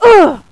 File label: wound